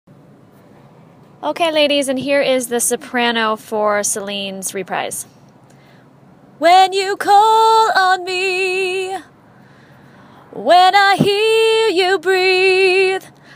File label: Soprano